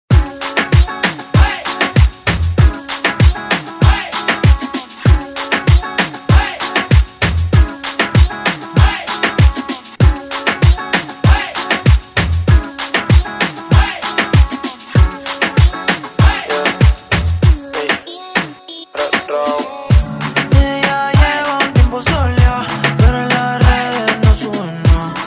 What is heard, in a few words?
Reggaeton Redrum